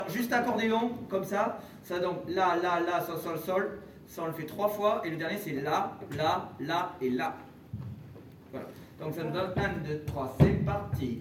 C pour diato; Belaye; Musique d-ensemble

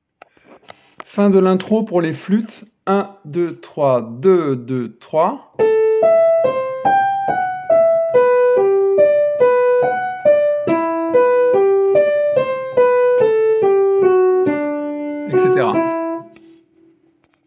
intro flûtes

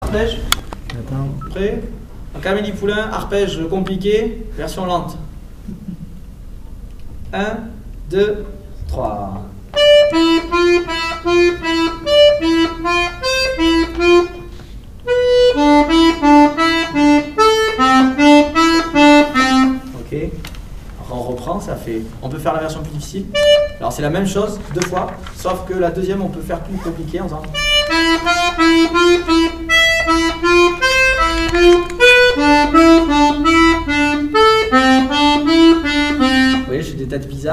valse Arpeges complexes lent

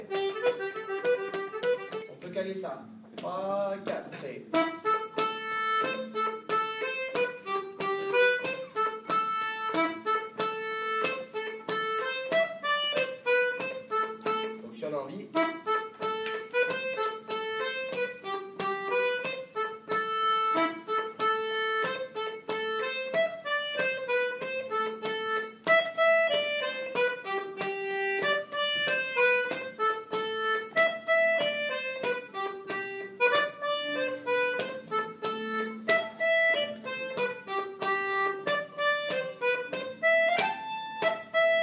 Polka en version simple; Finish set; Musique d-ensemble

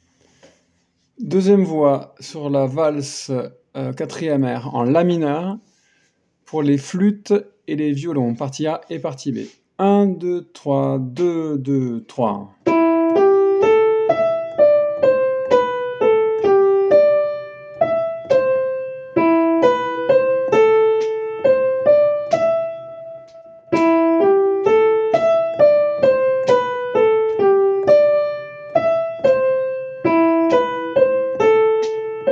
Am 2ème voix, Valse

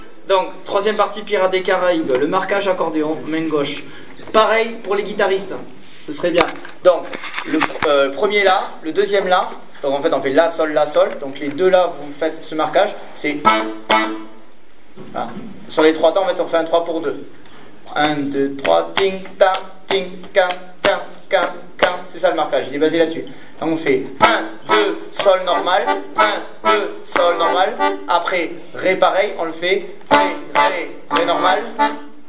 Marquage diatos guitares

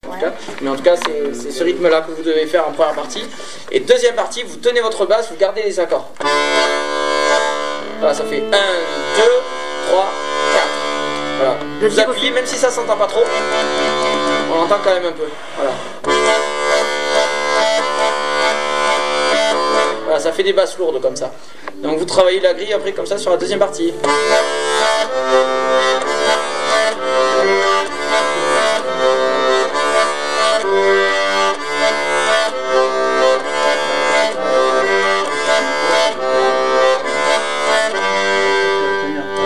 plan de RE pour diatos avec basses longues; Avant de s-en aller

